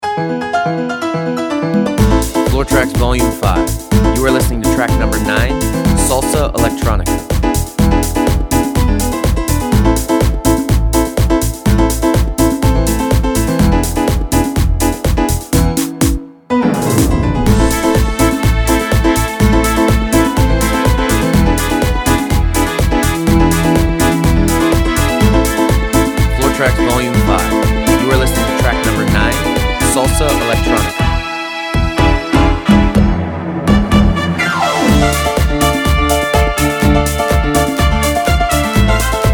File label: salsa electronica